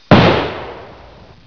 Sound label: gun